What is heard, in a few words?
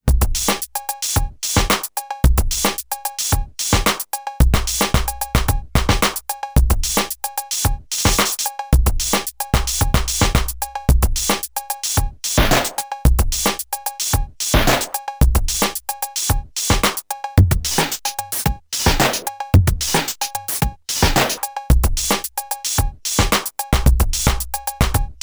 Drum Stutter; IKM Uno